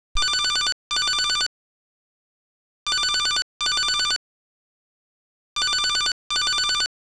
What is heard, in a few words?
call